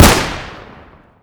ar close

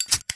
lighter

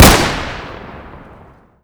close

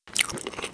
eat

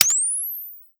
flashlight

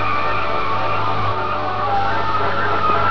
tv loop, ambient; d1 terminal 01

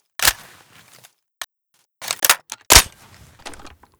ar reload

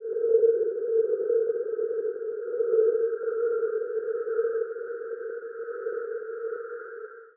hunt ambience

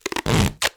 duct tape

